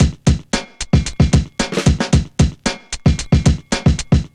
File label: funk bell 113; Zero-G; Funky Elements